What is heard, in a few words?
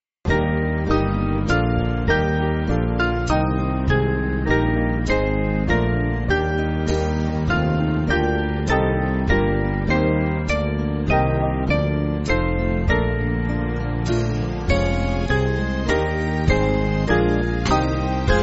Band, Music Backing Files for Churches; Thou Lord Hast Given; Crudwell